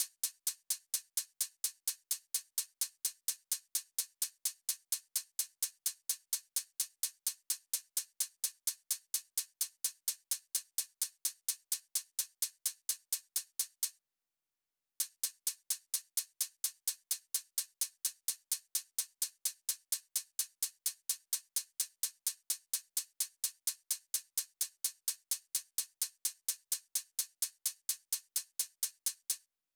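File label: 128BPM Hi-Hat closed; Demo Constr Kits; Early Morning Kit Root G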